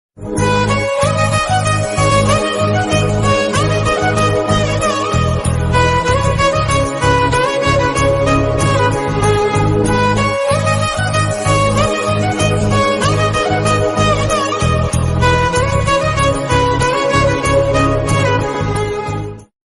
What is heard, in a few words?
tamil love bgm ringtone love ringtone